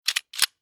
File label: Weapon Pistol Hand Gun Reload Old Austrian Steyr 1914; Pistol Sound Effects